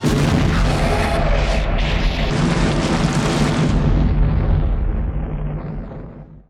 EXPLO; Best Service; Advanced Mega Effects

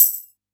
TAMBOURINE, PERC

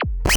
ZIP, FX, Analog; USB Soundscan vol.49; Analog Fantasies; AKAI

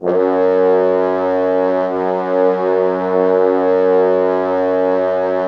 Orchestral Brass

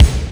KICK, drums; Big Fish Audio; Synth City

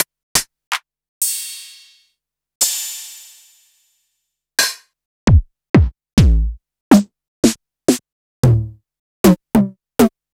Electro, Kit

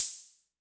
Hi Hats Open; LIMAT Div Dept

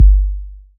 BD, Waldorf Blofeld

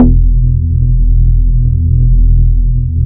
VIBRATION, Bass Analog & Synth; USB Soundscan vol.12; AKAI

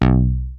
HEAVY THUMB, BASS; TECHNO-BOX; SYNTH+BASS

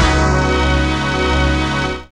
DISCO FX; Zero-G; Funky Elements

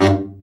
BRA Bari, SAX; Best Service Gigapack 1; BR SAX 2

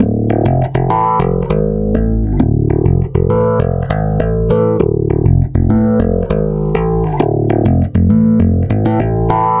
Stick Loop, Stick Bass

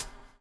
BUBBLE; Spectrasonics - Backbeat

